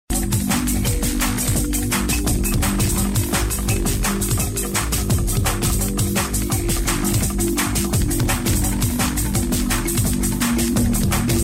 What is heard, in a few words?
170bpm kalimba beat